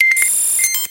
logic objective completed

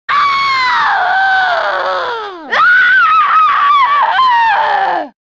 female scream

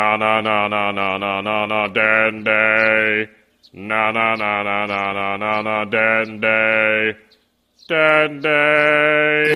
theme song; dende

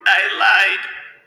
fart in a jar

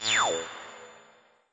exit sound effect; metal gear solid

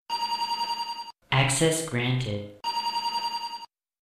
access granted sound

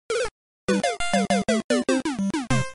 death sound effect

